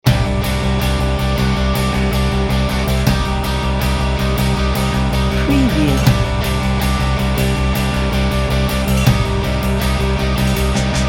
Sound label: extreme sport